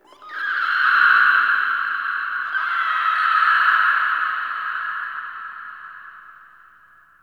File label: Interior Texture Painful Screech, Infested, Ambience; Misc Indoor